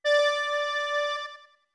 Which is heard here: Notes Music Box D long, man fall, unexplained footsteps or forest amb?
Notes Music Box D long